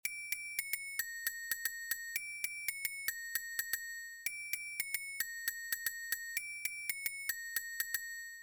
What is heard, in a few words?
Loop